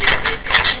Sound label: mechanical; openrsc